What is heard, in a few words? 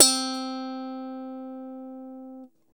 GTR, Clean E.Gtr, Brite Strat; Roland LCDP02 Guitar and Bass